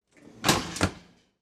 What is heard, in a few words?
oven door